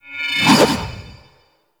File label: Sword Melee Heavy Charge; Drifter; Warframe; Teshin; Weapons